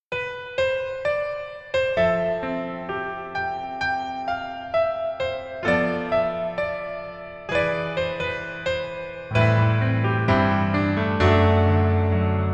Piano ver -1키

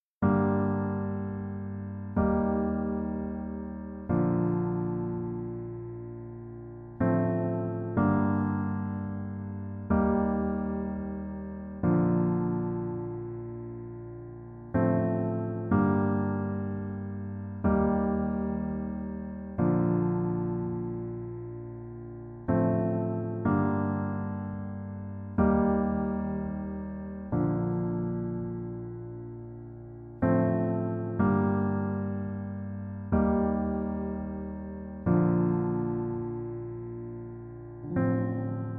Piano ver -1키

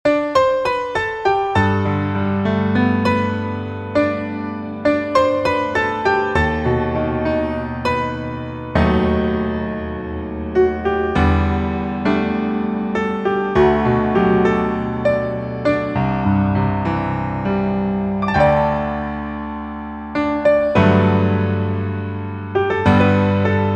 Piano ver 멜로디MR 남자키

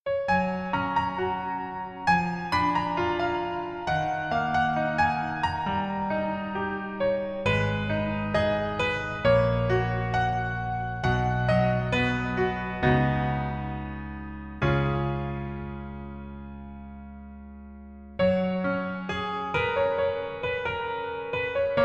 Piano ver 멜로디MR; 그 남자의 기억법

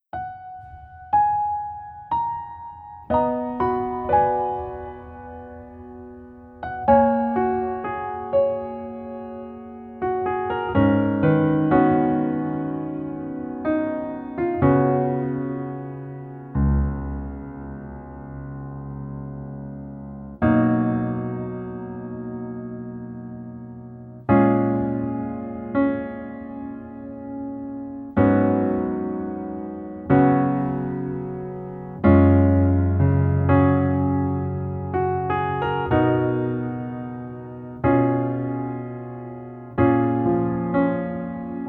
Piano ver -1키

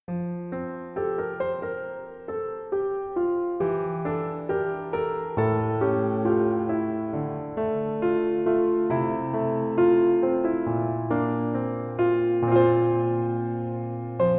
Piano ver 멜로디MR 여자키

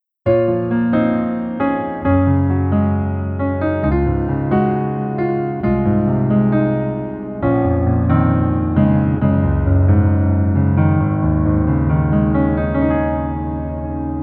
Piano ver 남자 키

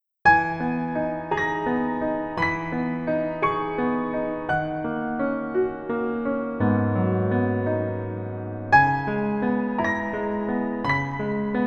Piano ver 멜로디MR

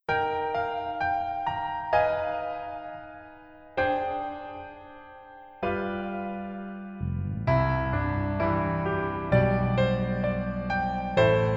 Piano ver 멜로디 MR -1 키

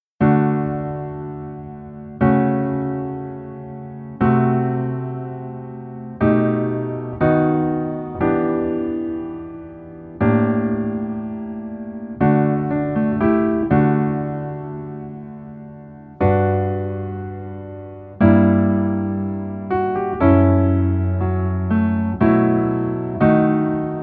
Piano ver -1키

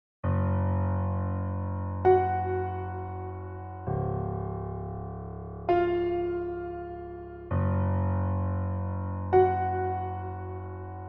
Piano 멜로디MR 남자키

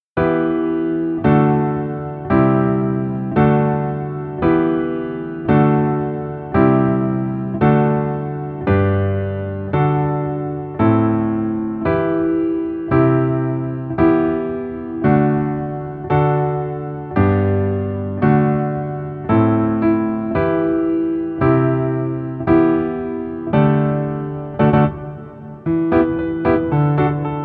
Piano ver 멜로디 MR -1 키

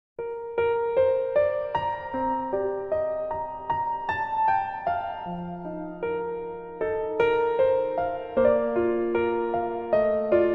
Piano ver, 멜로디MR, -1키

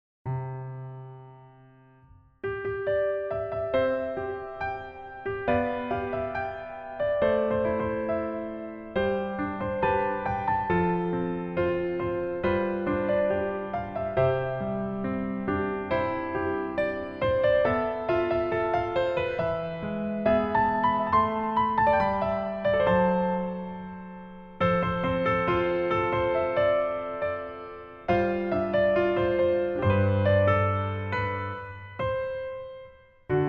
Piano 멜로디MR -1키

